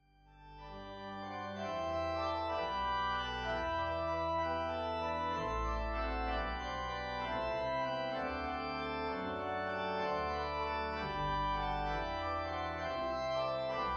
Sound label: jesu brass